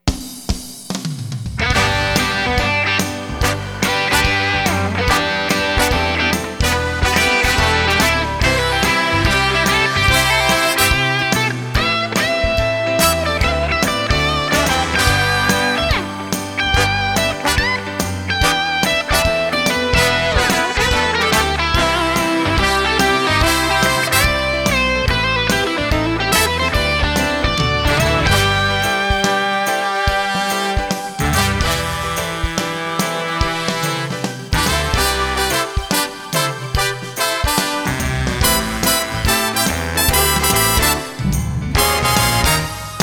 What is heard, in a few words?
Accompaniment; Bake Off Bows; The Great Big Holiday Bake Off